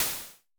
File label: Snare Hats, Vermona Mono15 Drums